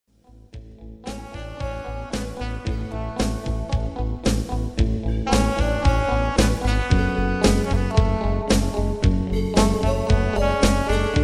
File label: sax; contemporary ballads